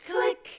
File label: camera click